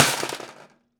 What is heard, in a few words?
Prepared Drums; Drum Kit Samples; Sonic Foundry (Sony Creative Software); The Electronic Music Manuscript Collection; Battery 3 Sample Kits